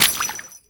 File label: Steps Water, Footsteps; Warframe; Grineer; Royal Jester; Foley